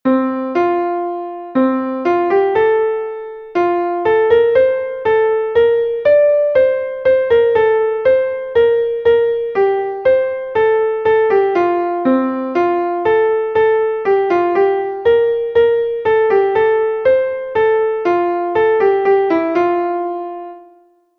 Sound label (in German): sankt martin ritt durch schnee und wind, klavier